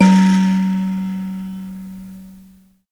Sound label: BUZZ, Buzz Kalimba; Roland LCDP11 Africa VOL-1